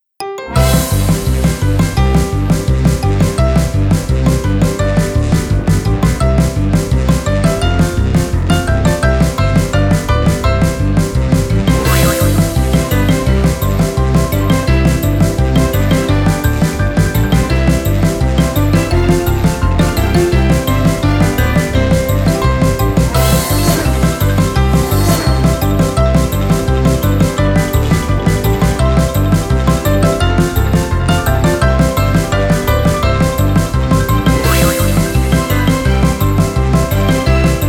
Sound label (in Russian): Big Race, Инструментальная музыка